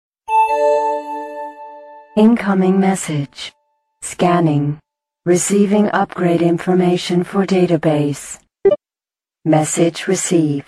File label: Galaxy s2 Sms Ringtone